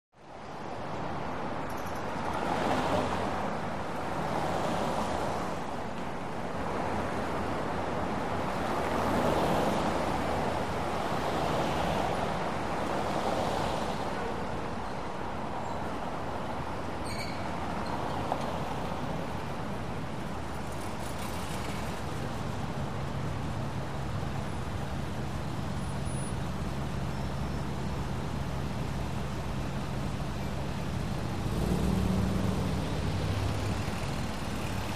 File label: Medium Traffic Close; car & truck bys